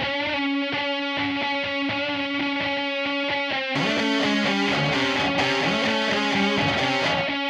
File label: gtr riff